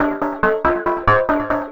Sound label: TECHNO EXTRA 140